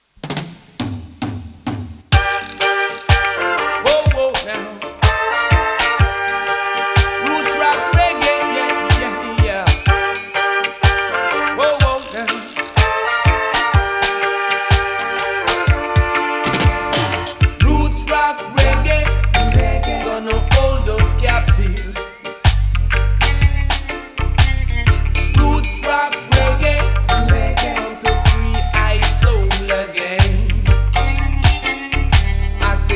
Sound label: roots rock reggae